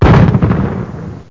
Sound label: crackup